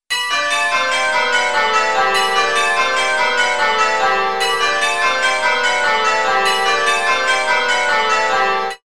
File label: Tittums, changeringing